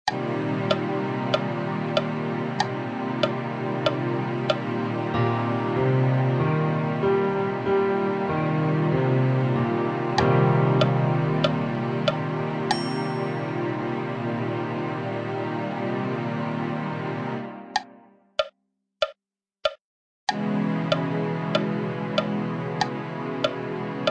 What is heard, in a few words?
min7 inv